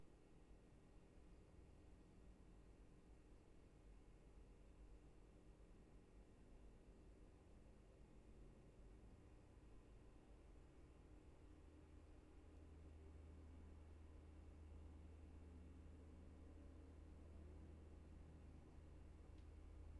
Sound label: roomtone